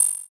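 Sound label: bell; suzu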